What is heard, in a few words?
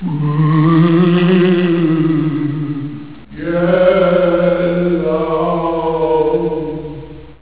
ghost attic ringtone